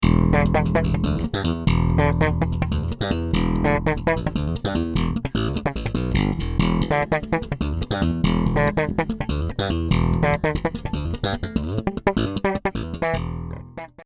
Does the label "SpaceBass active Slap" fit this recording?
Yes